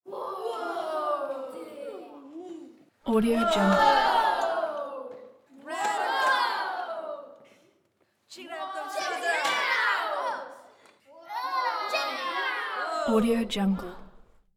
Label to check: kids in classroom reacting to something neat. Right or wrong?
Right